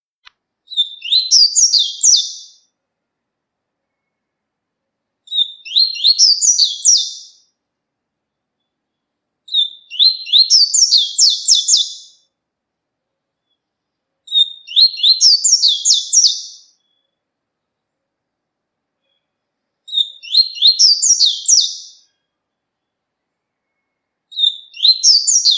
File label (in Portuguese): Canto Coleiro Baiano Tui Tui; Zero Zero